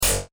Robot Droid Blaster Gun, science fiction sound effect